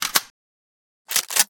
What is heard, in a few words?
Weapon Reload; RizzeBattleRoyale; HappySoulCC0